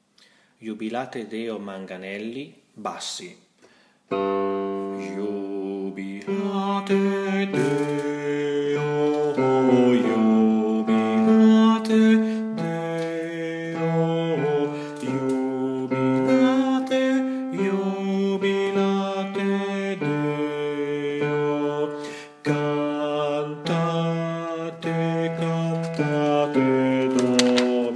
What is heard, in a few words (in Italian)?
Bassi; Iubilate